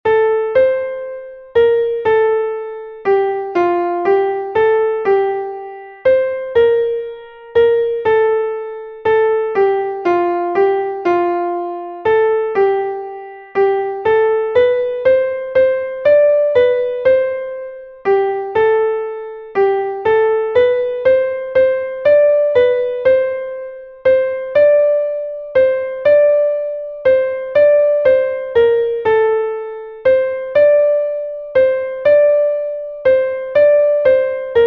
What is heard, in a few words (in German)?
klavier; MelodieMeister